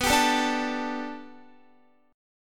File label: B Minor 7th Sharp 5th B Guitar, chord; Bm7#5 strummed